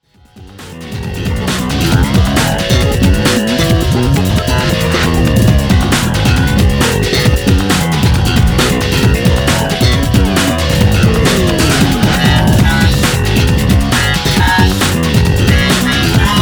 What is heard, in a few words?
8bit crush